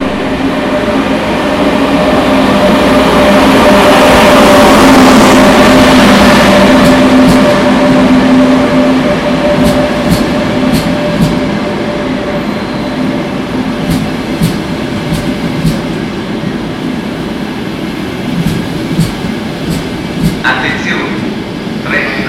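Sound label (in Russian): скоростной поезд Frecciarossa ETR 500 прибывает на станцию Bologna Centrale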